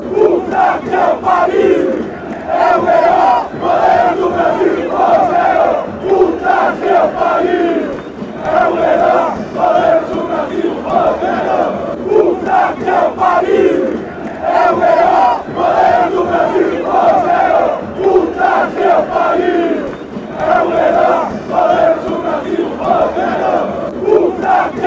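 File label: sao paulo chant, football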